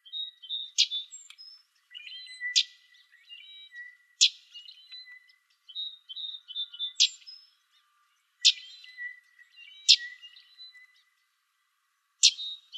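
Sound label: Common Yellowthroat calls, Other Warblers; COYE; deviche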